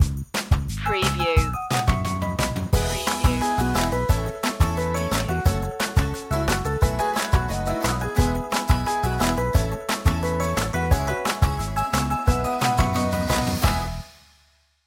pop

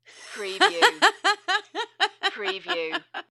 Lady Laugh

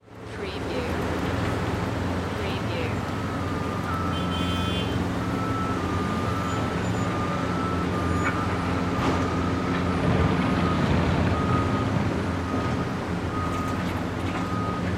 Construction Site

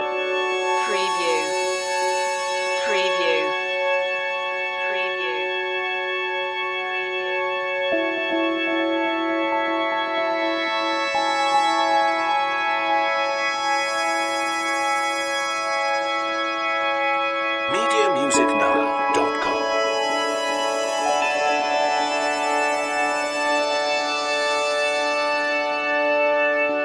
crystal underworld loop

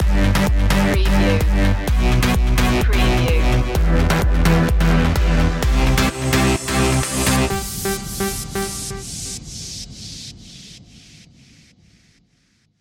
trance stinger

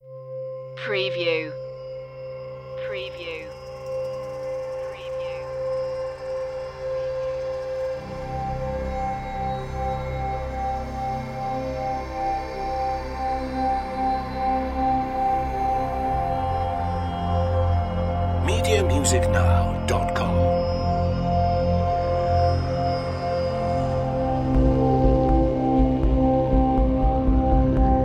in the middle of nowhere no woodblock

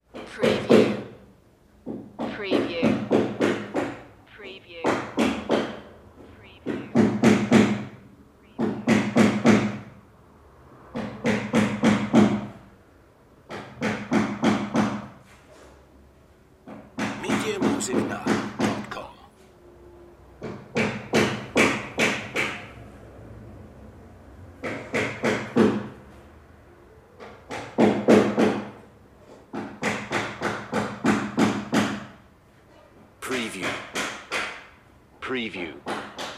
hammering

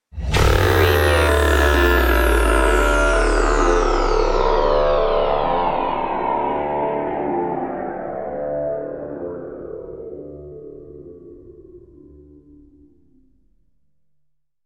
hacksaw stinger

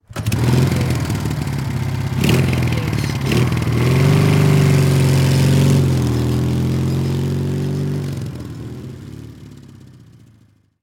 Motorcycle Start Drive

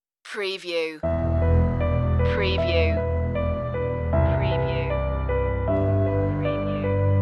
car advert music loop intro; Soundtrack / Action / Chase

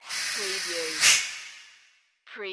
Reverb Fade In Hit

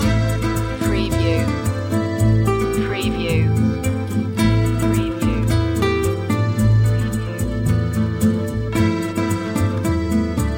mexican church loop

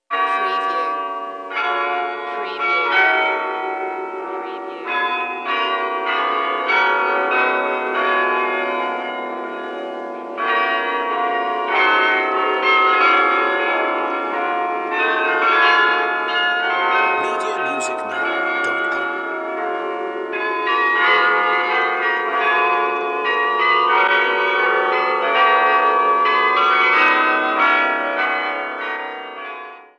Bells Tower Oh Canada

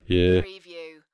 Male Yerr; beanstalk audio

